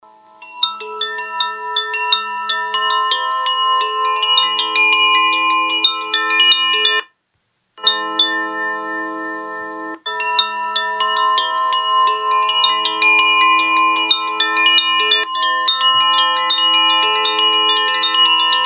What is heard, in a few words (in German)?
Aria Klangprobe, klangspiel